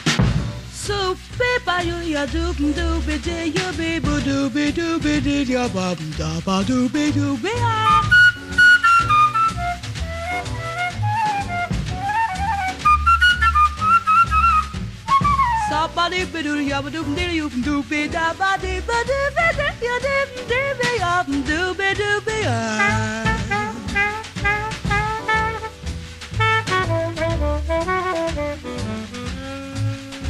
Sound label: CHORUS; Standard Jazz